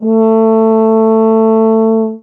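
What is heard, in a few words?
Horn A4; DirectX SDK; DMusProd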